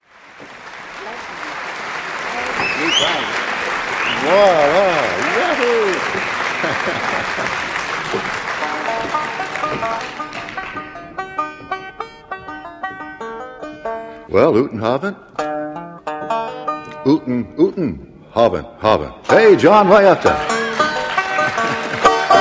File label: banjo, blues